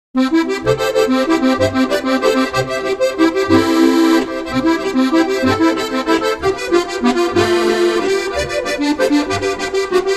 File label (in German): landler